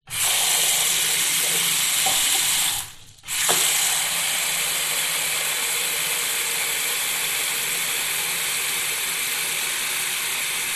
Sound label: household bathroom sink fill water plug release drain binaural room tone; zapsplat